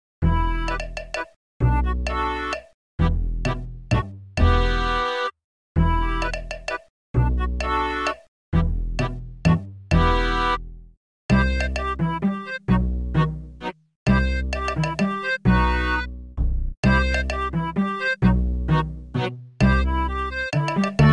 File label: sea shanty